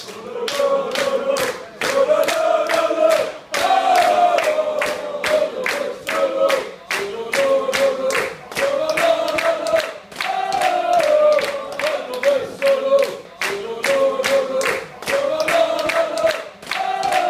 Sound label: shalala, fanchants; oddrane